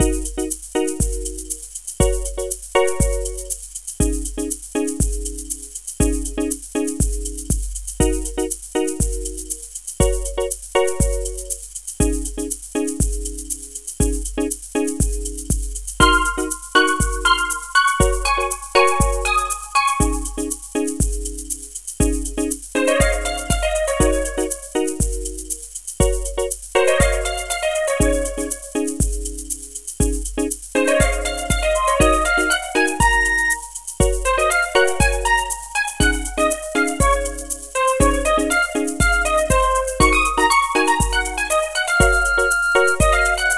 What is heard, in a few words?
RPG Overworld